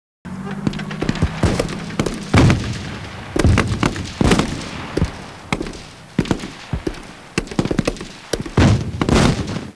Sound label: battle mix right